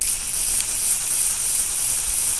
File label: reeling